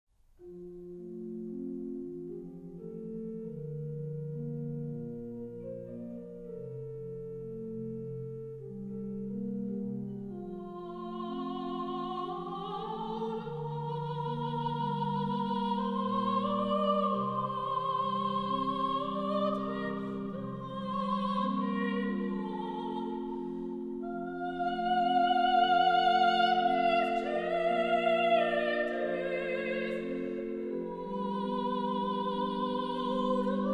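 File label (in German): soprano; trauermusik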